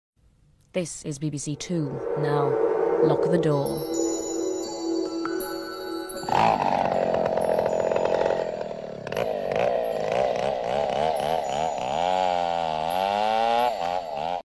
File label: bbc2 halloween night ident